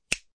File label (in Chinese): 响指声